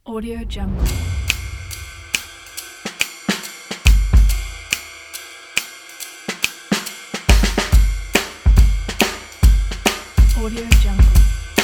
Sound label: jazz drum logo